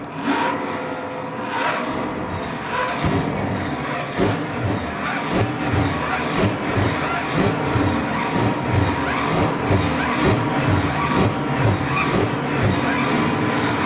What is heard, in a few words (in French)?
gratter circulaire